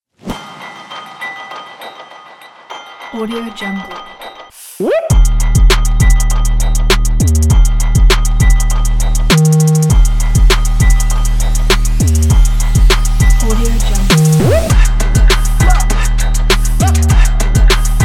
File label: rap beat; raspberrymusic